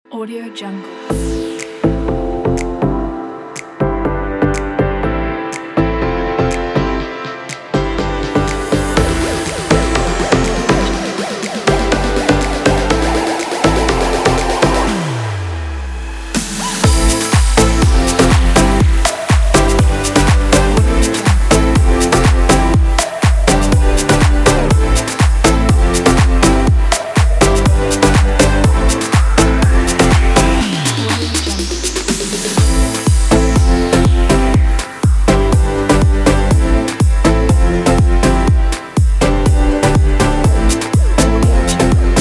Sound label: summer pop